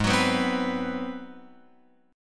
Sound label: G sharp 7th Sharp 9th, Guitar, Open F; strummed; x,3,6,6,3,6; G#7#9